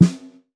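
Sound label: Black Brass Mallet, Snares; Drums; Ghosthack - Free Snares 2023; Blk Brass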